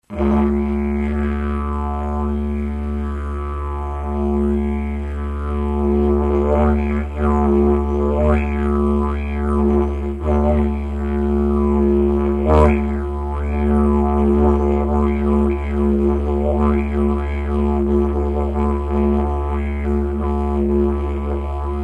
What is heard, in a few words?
didgeridoo; mixed music